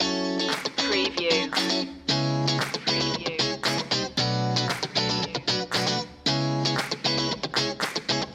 showtime loop

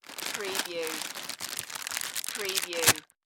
Cereal Bag Open

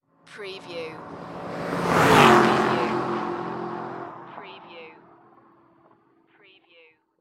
Auto Aston Martin; sound effects bible

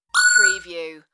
Zinger

